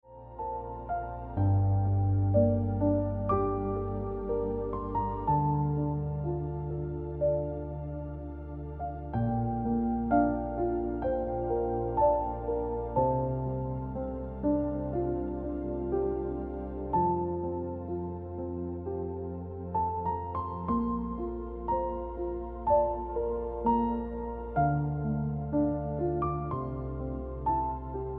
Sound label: Ringtone Piano Music